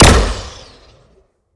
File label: Shot Medium Gun Mech Rifle